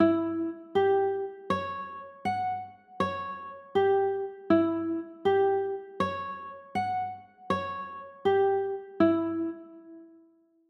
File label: a 11, chords